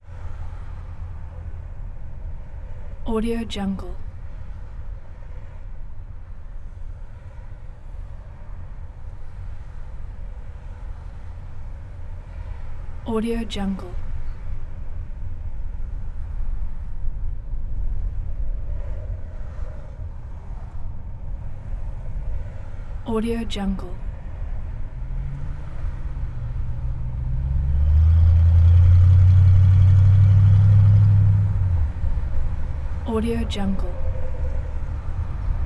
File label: vehicle pass by drivers rear window open